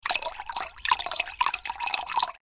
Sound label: Pouring water from plastic bottle into glass